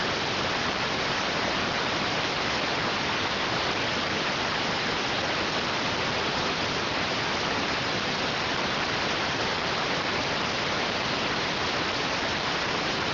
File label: rtty rx